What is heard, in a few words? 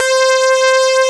Saw Wave, c5; BGM; Nintendo; July 2020 Gigaleak